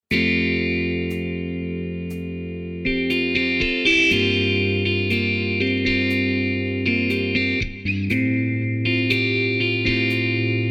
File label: orchestra rock ballad